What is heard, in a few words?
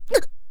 die; Eternal Return; Tia; ENG